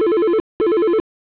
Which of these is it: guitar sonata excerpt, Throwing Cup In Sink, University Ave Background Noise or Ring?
Ring